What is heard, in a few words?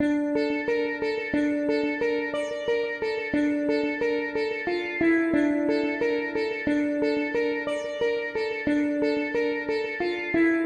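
Ethnic Loop Sitar 90 D minor; Ethnic Loop Vibes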